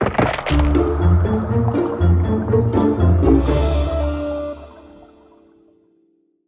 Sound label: outro Free Spin; netent; luckyangler